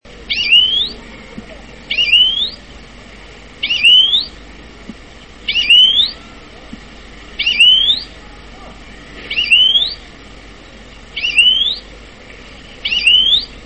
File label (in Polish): dzwoniec - nawoływanie; Dzwoniec jest małym, częściowo wędrownym ptakiem z podrodziny łuskaczy (Carduelinae)